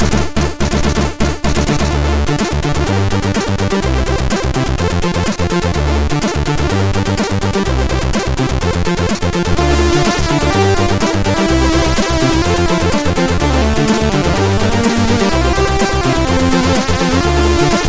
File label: tune, MOS6581R3; Aaaargh